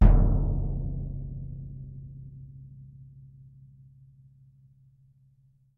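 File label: bass drum, Percussion; Miroslav Vitous - Percussion & Harp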